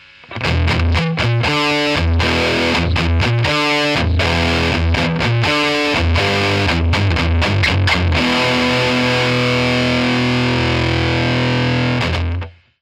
harley benton gain full

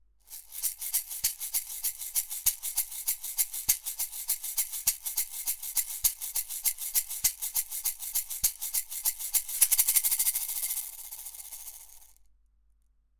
shaker b kugel flat; manley reference silver

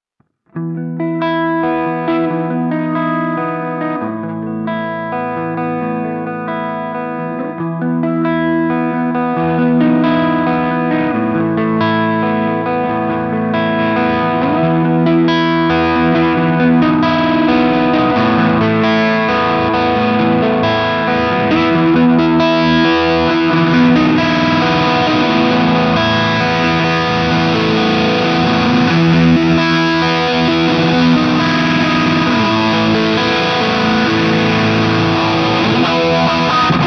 harley benton dnafx git

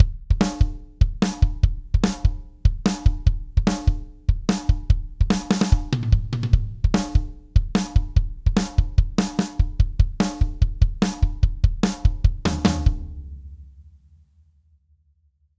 drums dry; chandler limited rs124

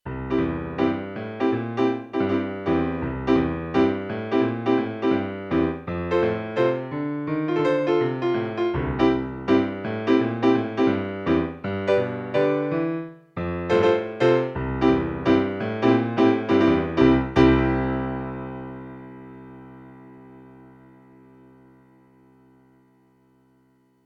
casio xw-g1 strobrtpiano

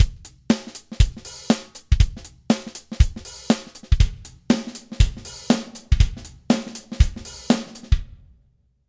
drums; exponential audio r2

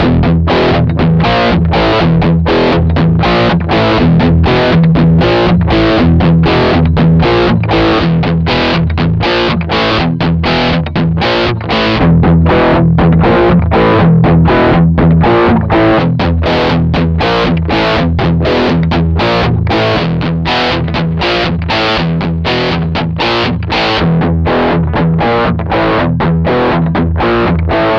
ampeg gvt dist esp eclipse; palmer pdi03

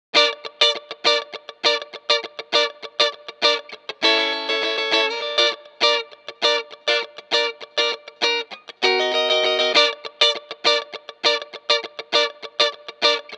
moog multimode filter funk guitar; universal audio

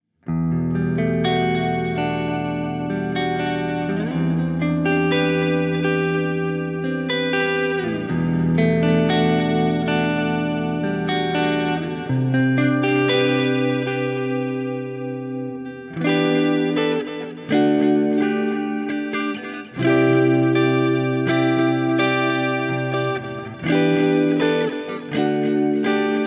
harley benton erx84 modern

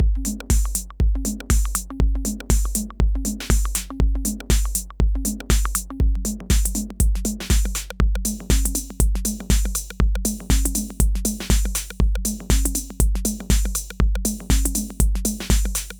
musicloop; presonus goldbaby essentials collection test

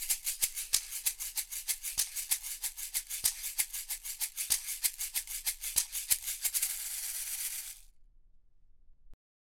shaker thomann sct800

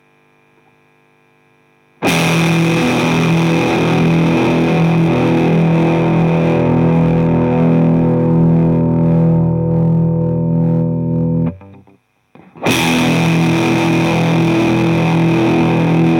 all mid; kma fuzzly bear

